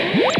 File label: circle choose; Freestyle Street Soccer; XBOX